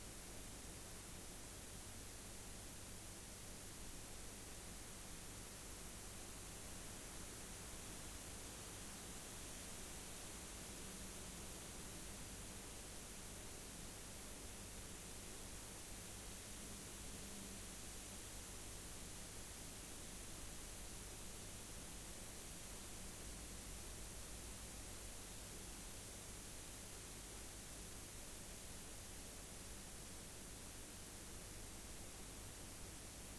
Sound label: rayol farm; SEAMED